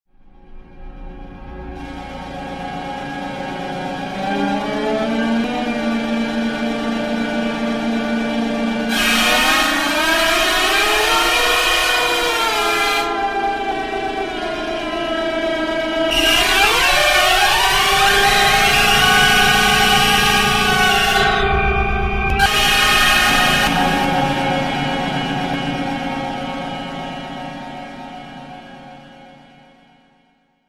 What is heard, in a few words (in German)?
kreissaege